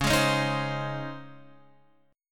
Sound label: C sharp Major 7th Suspended 2nd, C sharp; C#M7sus2 Chord {9 11 11 8 X 8} strummed